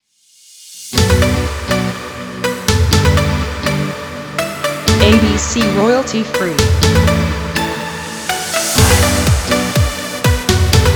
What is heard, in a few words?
Summer Party Pop